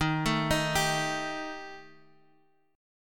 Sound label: D sharp Major Flat 5th, Guitar, Half Step Down